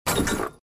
Chain basketball net

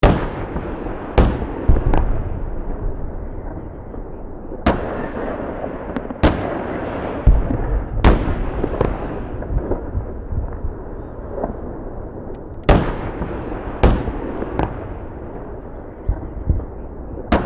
Tank fire on the battlefield

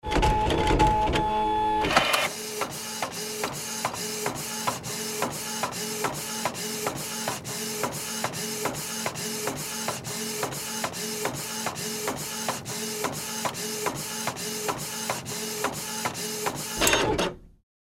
Epson inkjet printer printing a page

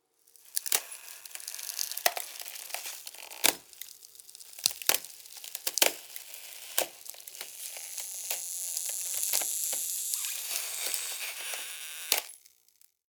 Crunchy ice pressure and cracks

